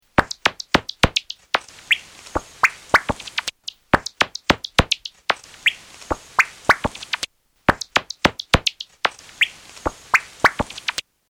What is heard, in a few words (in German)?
Abendsegler Ortungsruf heterodyn